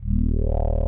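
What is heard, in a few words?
force Mod start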